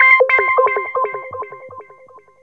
ANALOG ECHO; Zero-G Ambient 1

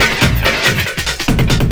LOOP SD, Drum & Bass, 140 BPM; Ueberschall; Drum & Bass Flash Trackz

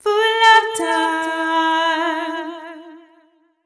f 132 mel, Vocals, fem

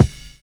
BUZZ SNAP; Univers Sons - Kitch 70s

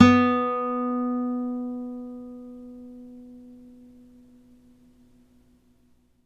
NYLON F B2, GUITARS; Synclavier Sampler Library

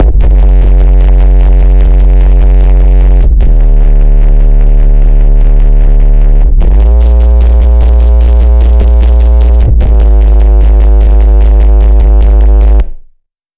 distorted progression, bass

